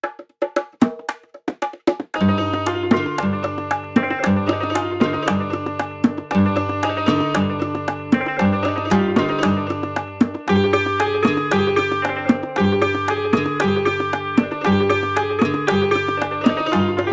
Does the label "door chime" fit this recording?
No